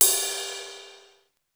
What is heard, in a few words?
RIDE, Classic drum machines; SR16; musicradar-synth-samples; SR16CYMB